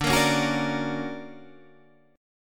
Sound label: D Augmented Major 7th down Guitar Standard; D+M7 strummed; JGuitar